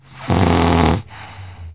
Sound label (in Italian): persone russare